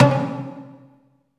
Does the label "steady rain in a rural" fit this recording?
No